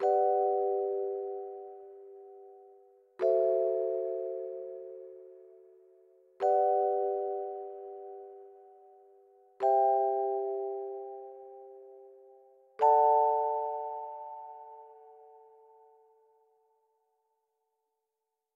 music box loop; Barlowmusicboxloop

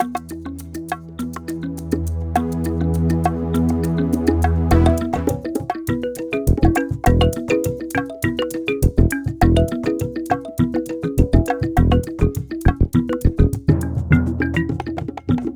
music beach, bpm102; Donkey Kong Country Returns HD

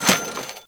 Shop buy; Awesome Planes

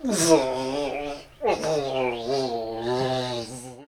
Stun Loop

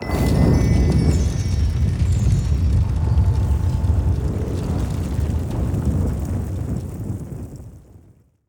sfx dream start; Reigns Game of Thrones

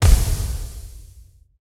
Zombie Fungus Explode; gamerip

